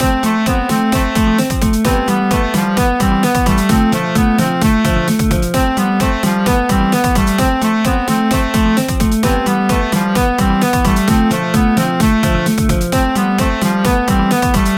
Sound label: Woodwind